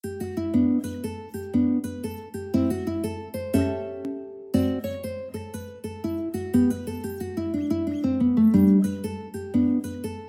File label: mandolin